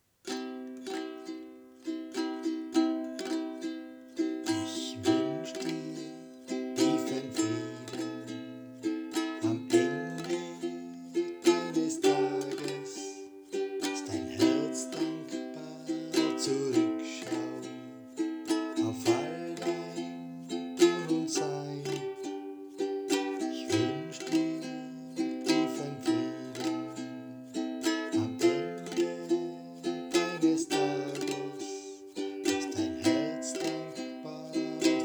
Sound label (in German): standartschlag